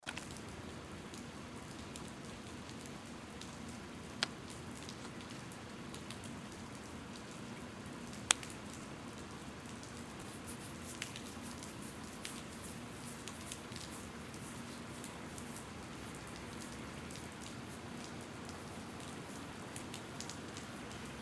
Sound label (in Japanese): シンプル耳かき六月十五分雨音大